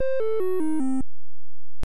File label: Duck Fly Away